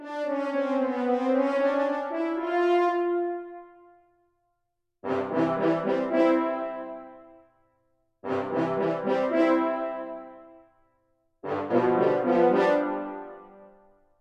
Underwater; Super Mario Bros.

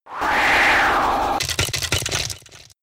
Icy Wind; Pokemon; Gen 5; Blk Wht Blk2 Wht2

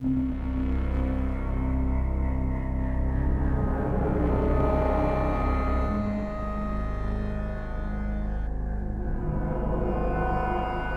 BGM Death Music; Daniel X The Ultimate Power; NDS

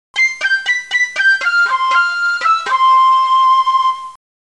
Flute; Eastern Mind The Lost Souls of Tong Nou; Flute Of Water

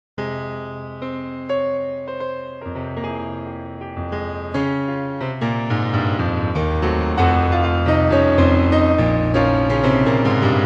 Piano; Death to Spies 2 Moment of Truth

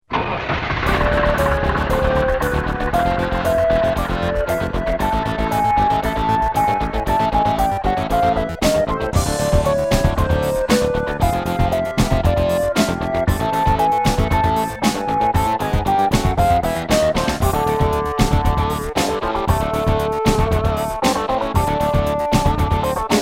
Level 3 BGM, PC Engine CD-ROM; r-type complete cd turbografx 16; Irem

